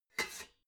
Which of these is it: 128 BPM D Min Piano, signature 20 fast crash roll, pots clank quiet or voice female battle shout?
pots clank quiet